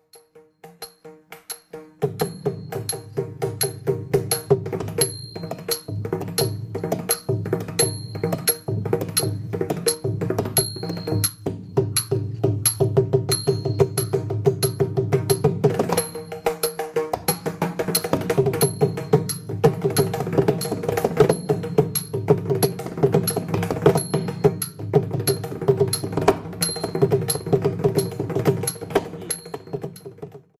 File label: mridangam solo